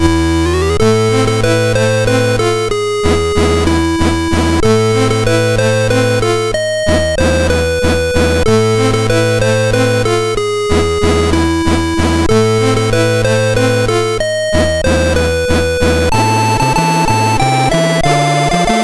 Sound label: sid MOS6581R4